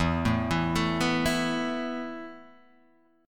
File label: A Suspended 2nd E